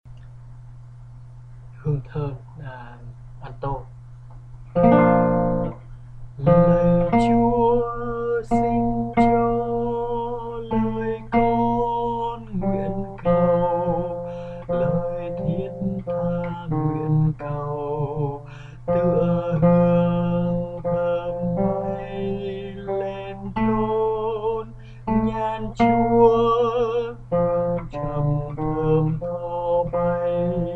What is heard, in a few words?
Alto